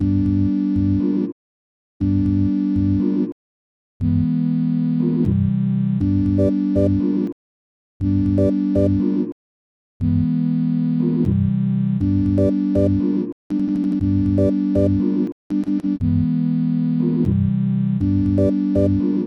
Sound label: Sine Wave